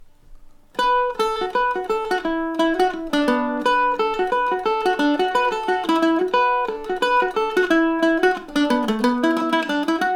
laffertys, mandolin

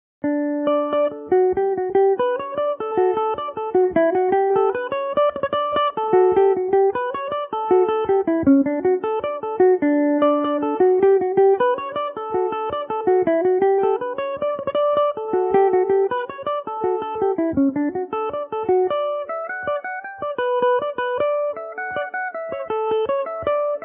benedicts rambles, mandolin; Revelation Jaguar electric mandolin; Version 2 with a different amp emulation

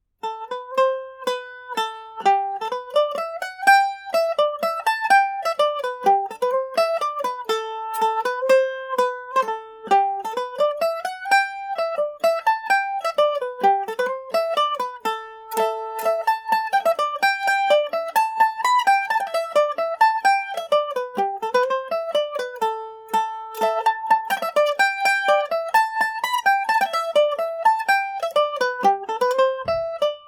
polka md304, Irish mandolin; ballydesmond polka number 2 revisited

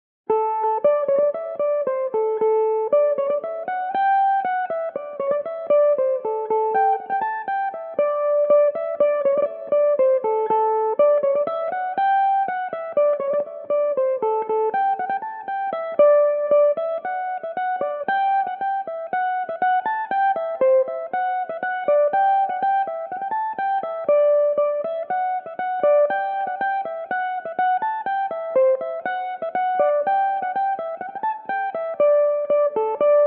polka, Irish mandolin; Jim Keefe's Polka

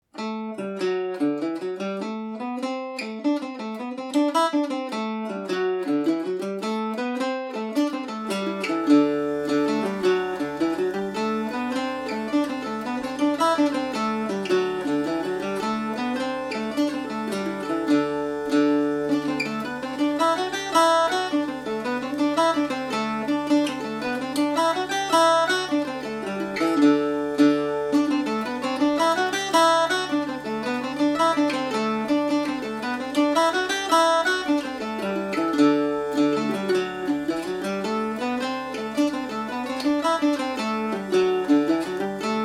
jig, uilleann pipe drone; Ashbury AT-40 guitar and Paris Swing Macaferri mandolin with Uilleann pipe drone (virtual trio…)

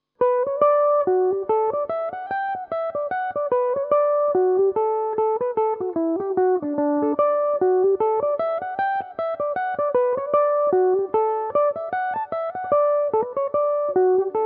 reel D major, mandolin; Belmuse

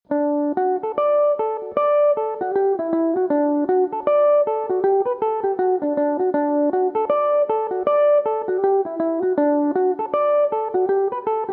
all round the room dmaj, mandolin

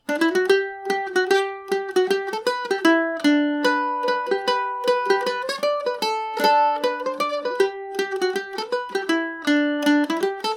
barndance, mandolin; lucy farrs

